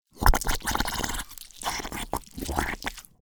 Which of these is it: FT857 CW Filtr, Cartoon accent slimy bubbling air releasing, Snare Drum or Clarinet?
Cartoon accent slimy bubbling air releasing